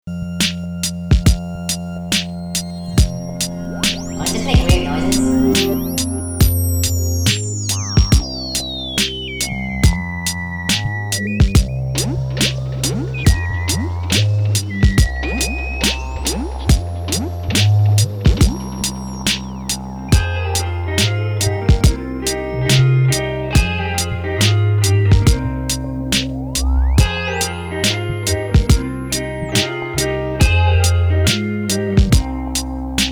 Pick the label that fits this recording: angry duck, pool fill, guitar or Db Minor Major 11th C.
guitar